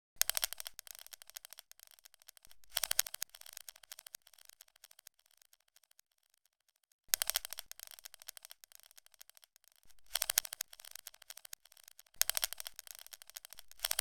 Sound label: Measuring Tape roll up Delay Loop